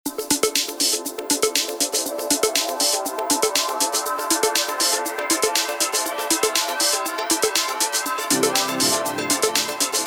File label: Upbeat